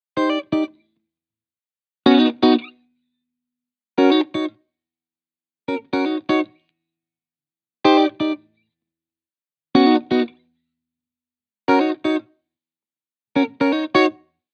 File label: Guitar Bpm125 Key D#, 70s Guitars; Fox Samples - Back to the 70s Guitars